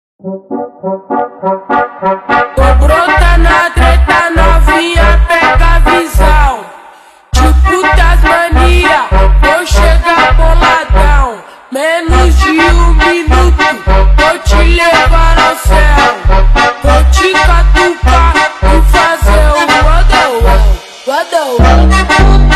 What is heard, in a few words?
SUPER SLOWED REVERB